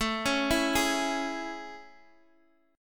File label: A Major 7th Guitar Half Step Down